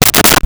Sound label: Cardboard Flutter; Office Supplies